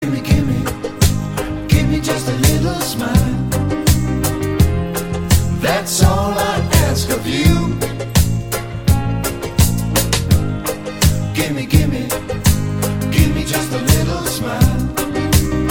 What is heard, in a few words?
Laid Back Sunshine Reggae